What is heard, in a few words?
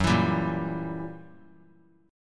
Fsharp 7th Flat 9th down, F#7b9 chord; strummed; JGuitar